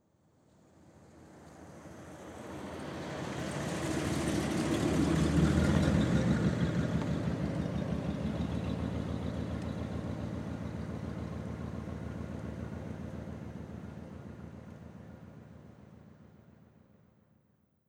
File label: 50 packard passing slowly; strassenverkehr